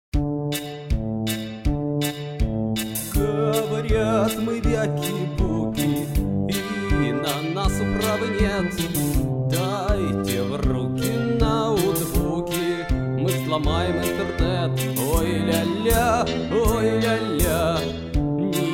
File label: Karaoke